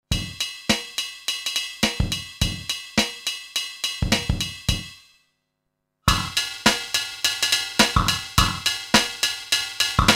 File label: Drumloop; Io Instruments Pasiphae